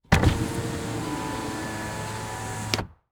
Transportation Jeep Commander Open Window Interior